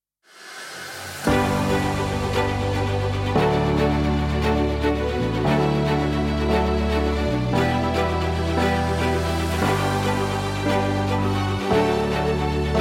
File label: watani